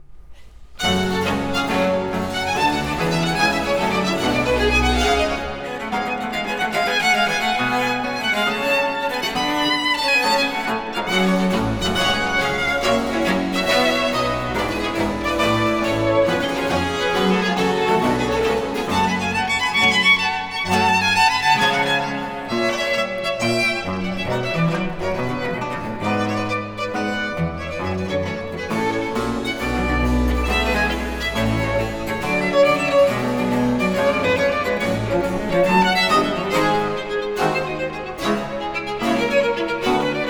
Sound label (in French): Concerto pour deux violons et violoncelle, Allegro; The Four Seasons